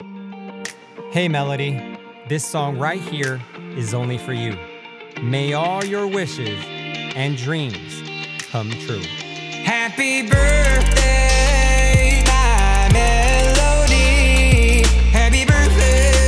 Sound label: Happy Birthday Melody